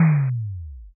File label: impact text